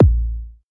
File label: old school big steel kick drum a sharp key, kick drum sound tuned to the A#